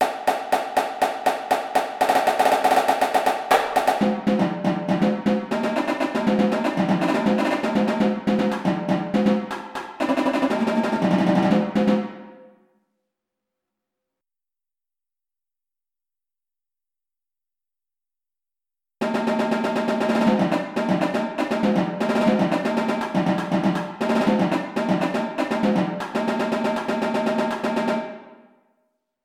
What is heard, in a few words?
cadence tenors